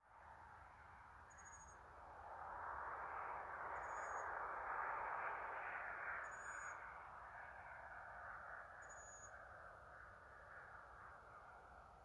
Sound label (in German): Tiere der Nacht